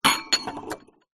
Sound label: household wine glasses x3 slide together on wooden surface